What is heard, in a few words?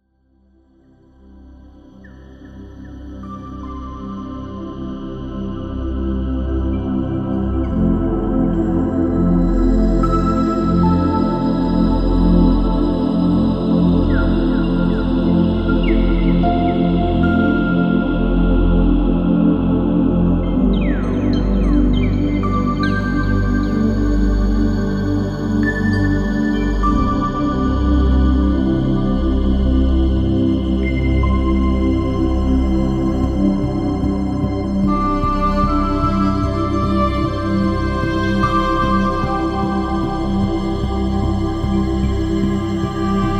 Mystic Atmosphere